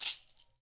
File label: SHAKER, Heart of Africa; Spectrasonics; Heart of Africa 1